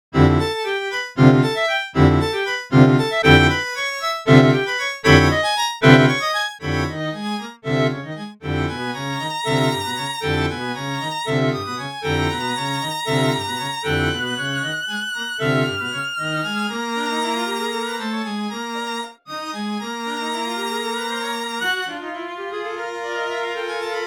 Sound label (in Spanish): Cuarteto